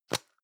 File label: plastic can lid screw open hard; objekte; dose